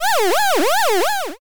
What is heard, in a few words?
confuse